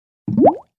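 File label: bubble sound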